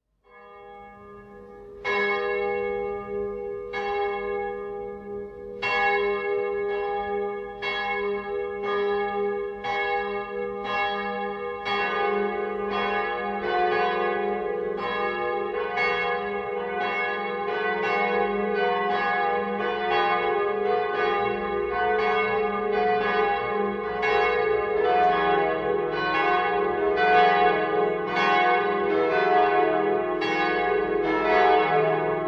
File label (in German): Teilgelaeut auf gis, Domglocken